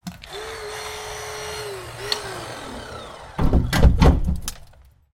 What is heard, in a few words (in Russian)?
otrezayut kusok metalla detal padaet